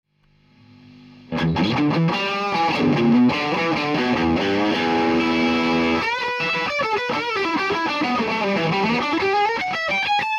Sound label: DST, preamp